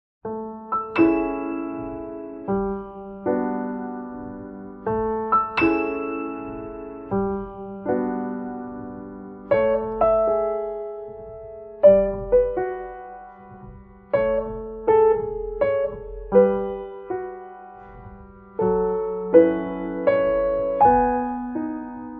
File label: Piano cover; ANIMAL CROSSING Wild World